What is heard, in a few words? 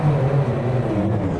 starter fade, train, class 66; OpenBVE